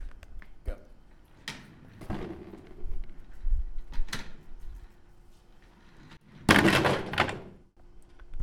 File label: Room Desk